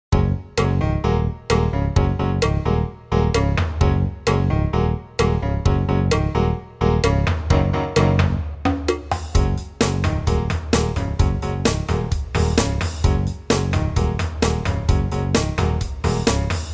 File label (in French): VERSION KARAOKE